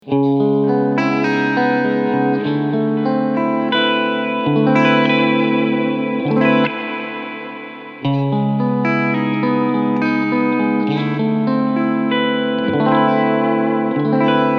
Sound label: Spring Reverb